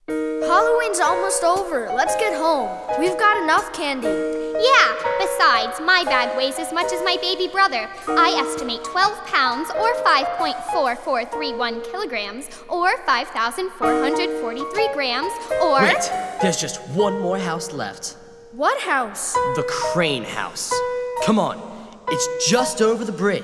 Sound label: Trick or Treat Background Music